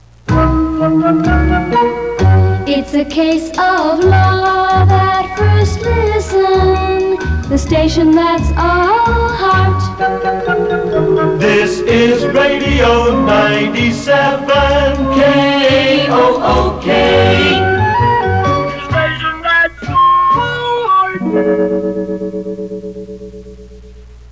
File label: jingle; kook 970 billings mt